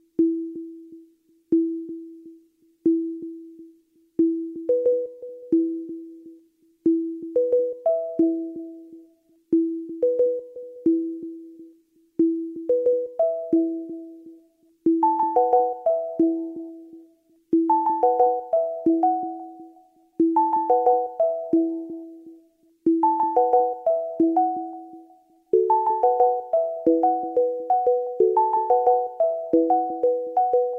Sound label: Melodica Ringtone; ringtones